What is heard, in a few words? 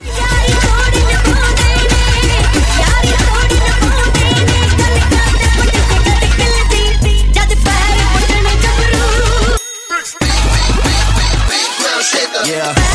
Punjabi Song ringtone